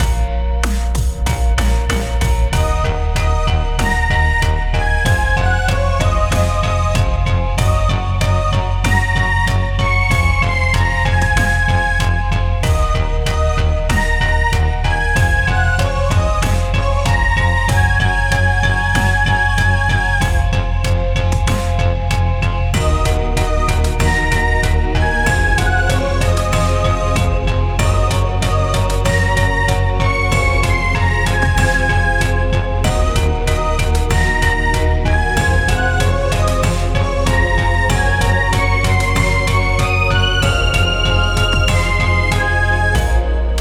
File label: racing game result bad bpm95